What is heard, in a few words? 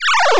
8 Bit Wind Down